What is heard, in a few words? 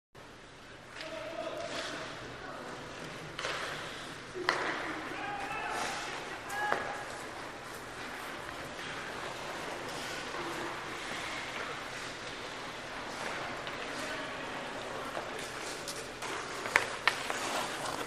Ice Hockey Scrimmage